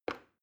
plastic button start release regular

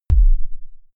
sub kick noise short

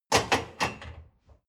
dishwasher cup place

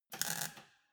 weld sparkle short

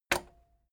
cassette eject push heavy

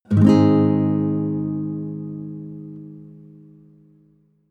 nylon guitar chord full major

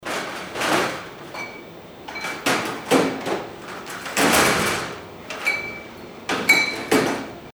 bottle case noise

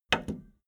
video tape player plastic stop click slow

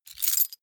key ring large place

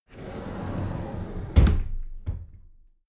cupboard slide door close regular

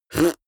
beatbox effect suck in damped

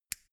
mini switch turn off hard

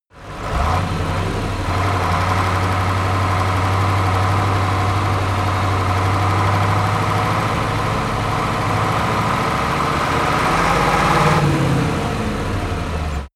truck ural 4320 slow acceleration

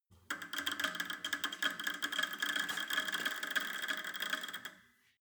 cable machine creak up

